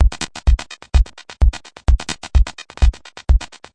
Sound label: 128 glitchy 4 onna floor, DRUM LOOPS and BREAKS